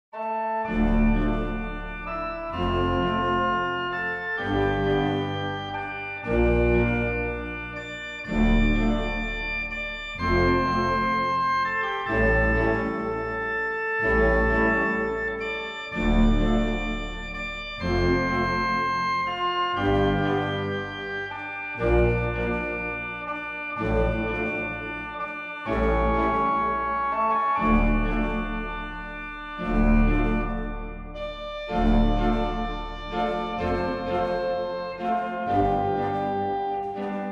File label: Pop On Organ